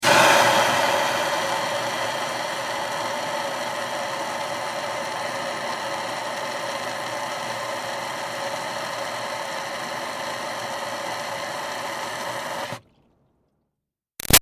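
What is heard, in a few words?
Water Spray Interior Car Steady Hard; Car Wash; Spraying; Big Room Sound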